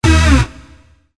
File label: Hoover